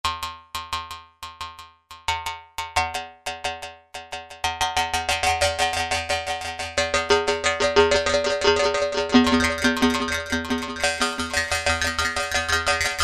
Vibe Hit, Korg M1; Factory preset examples

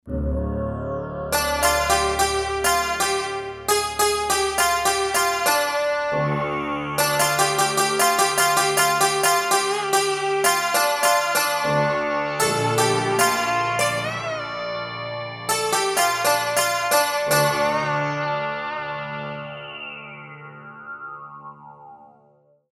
Drone Split

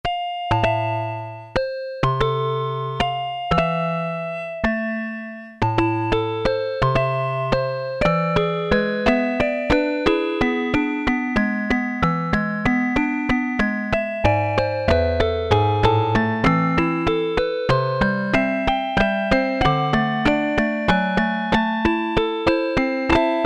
Chime Piano, Korg Poly-800

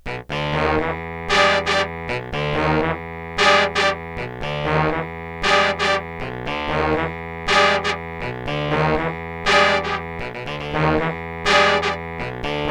bari sax horn section octaves

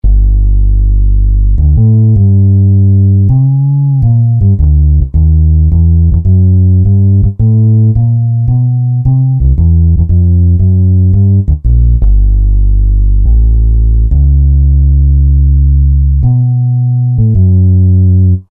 Ac. Upright, Roland JV-2080

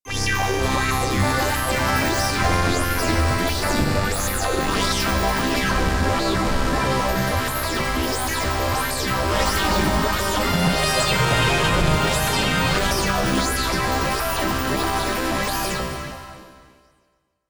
Bass Chime, Korg Radias-R

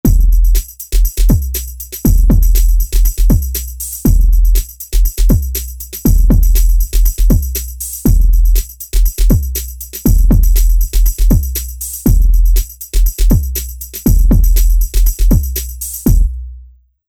Rap Hip Hop

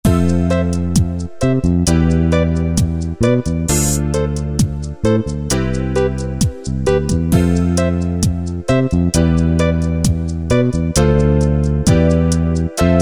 Lovers Rock, Yamaha QY70 Preset Styles

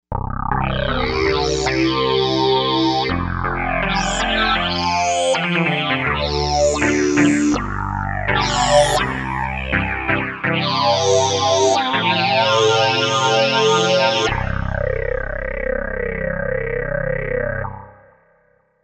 Talkin Toy Piano, Novation UltraNova; Factory Patches

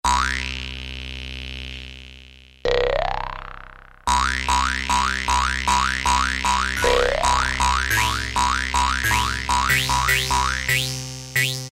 BOING !; Latin And F.X. Percussions; Roland SN-U110-02

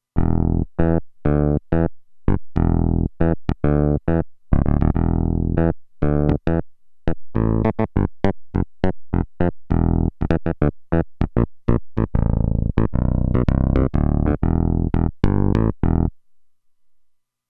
Electric Bass, Roland Alpha Juno-2; Original Sound Chart patches

